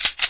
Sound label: usp slide back, weapons; Source Engine; cstrike